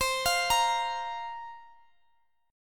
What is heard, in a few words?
C 7th Suspended 4th, Guitar, DADGAD